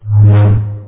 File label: swing